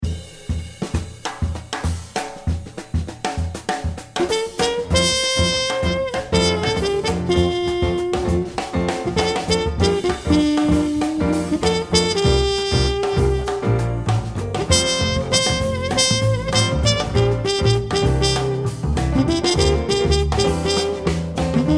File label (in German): musik solo mit halbplayback